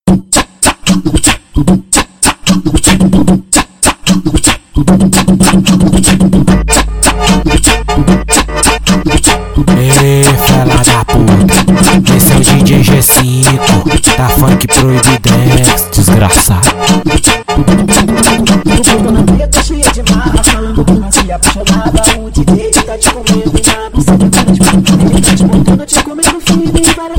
beat tcha

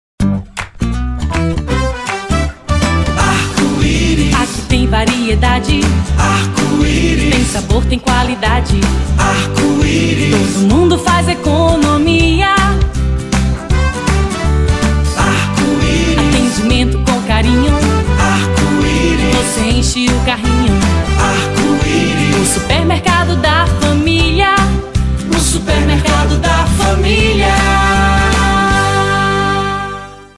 jingle supermercado; arco iris

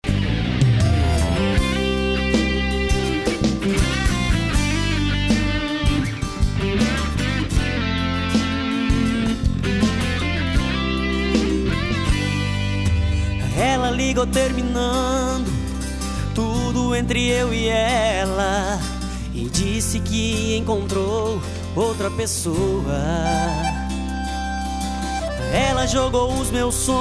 o melhor do sertanejo universitario

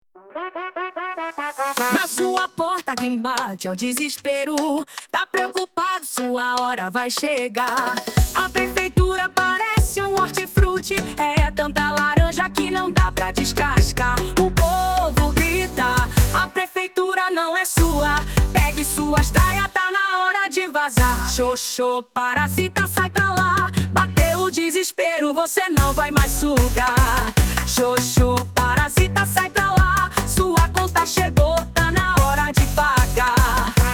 funk

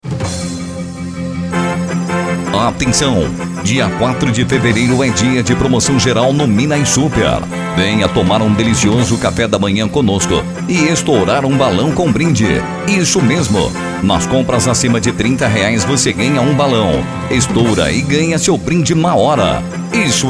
minas super jingle; studio gp

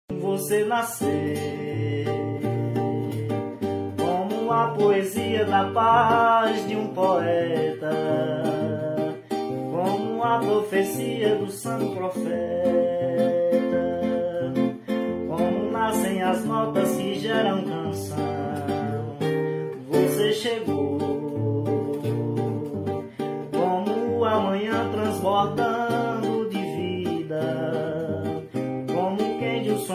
samba

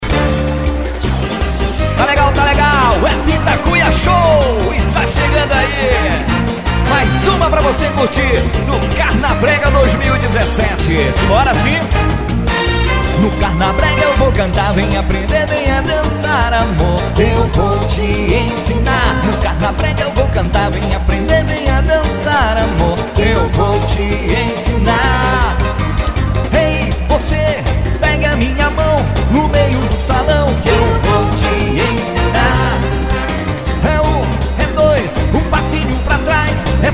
a dança do brega eletrico

